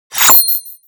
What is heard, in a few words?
Coin Big Spawn Small